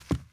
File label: carpet, Footsteps